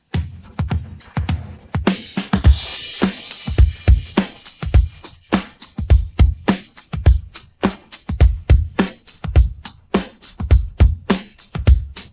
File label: drums pattern